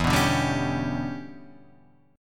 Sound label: E Minor 13th guitar chord, Half Step Down